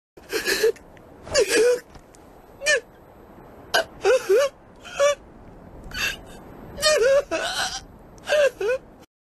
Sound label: anime cry